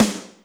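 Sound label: Snare Studio A, Alesis D4 drum machine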